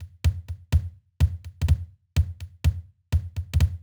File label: 125 Grooves, Percussion; Touch Loops; ElementsOne; Abstract Rhythms